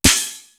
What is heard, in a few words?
HOUSE SNARE; East West; Global Sounds